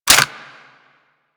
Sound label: Weapon Jam Hit B; Grineer